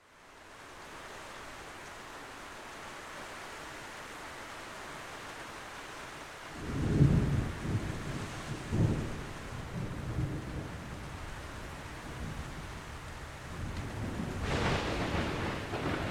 nature thunder strikes over head loud various light rain